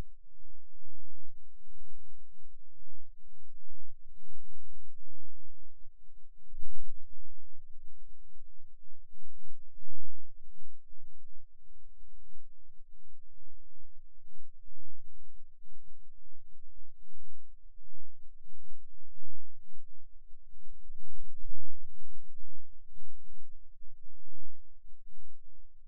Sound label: Rhythm Wave, eeg seizures